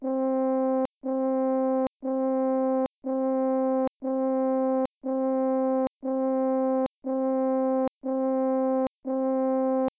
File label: horn, Original Horn